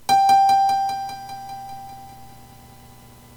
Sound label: Single Note G